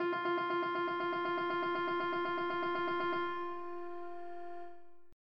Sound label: test panning